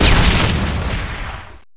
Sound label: explode rocket